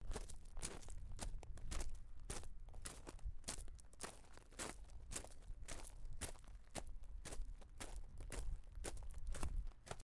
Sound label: Walking On Stones